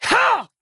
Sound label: attack